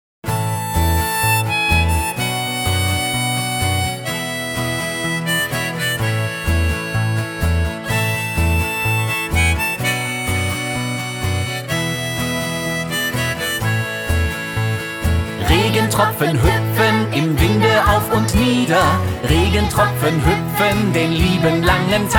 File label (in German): Regentropfen hüpfen